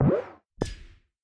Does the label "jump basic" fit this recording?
Yes